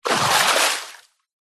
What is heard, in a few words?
nature water movement surface splash leg; zapsplat